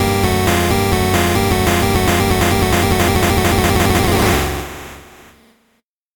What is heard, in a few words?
8bit jingle slow